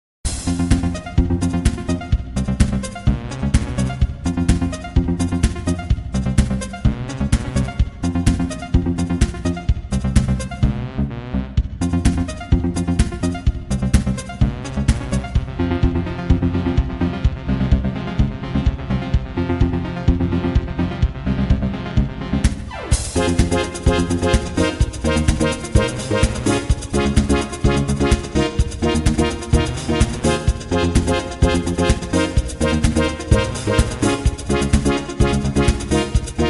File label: Level Track; block breaker 3